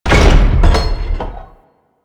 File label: Big Door Opened; Warframe; Doors